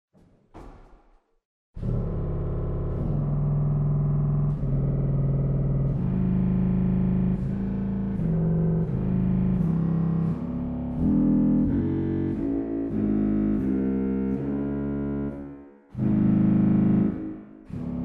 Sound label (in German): Posaunen Bass 32, organ; waltershausen